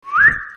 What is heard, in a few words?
whistle up